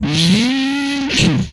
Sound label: Hydraulic